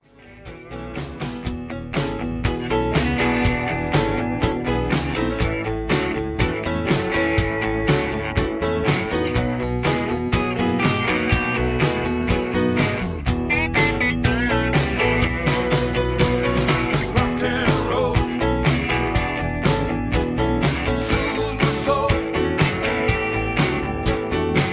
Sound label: Key E